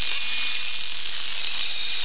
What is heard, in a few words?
loop, techno atmos